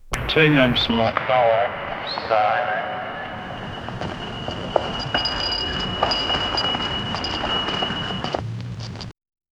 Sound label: vintage rotary telephone